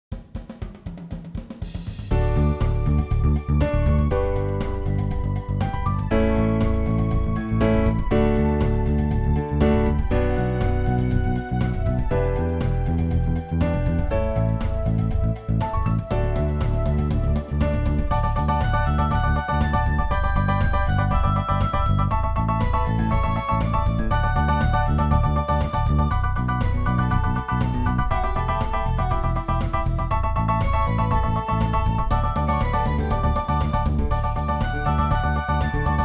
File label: backing; New Hope Music